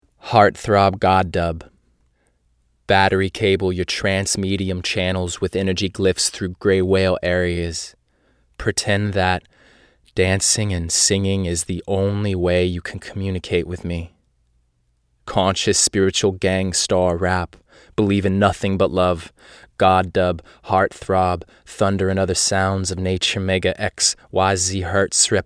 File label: Dub